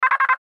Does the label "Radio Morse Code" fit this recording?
Yes